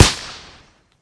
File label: m4a1 silence 3p; EMXArms; rivet